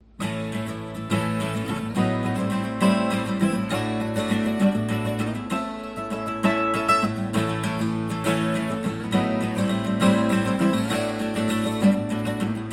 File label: acoustic 12 string; sigma dm12 1st series